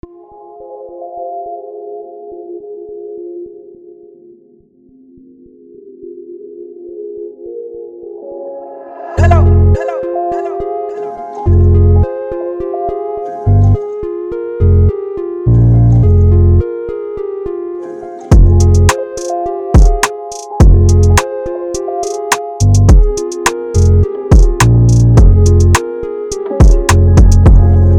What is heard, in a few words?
Crash 105bpm F minor, beats